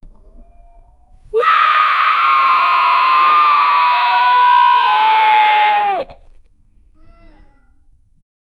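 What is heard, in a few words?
goblin short thick shouting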